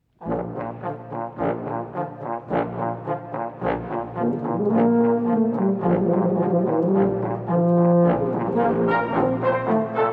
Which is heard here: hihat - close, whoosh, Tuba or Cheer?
Tuba